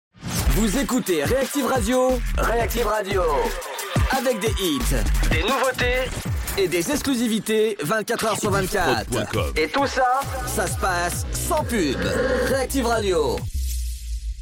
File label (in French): Radio Jingle Hits nouveautes; Reactiv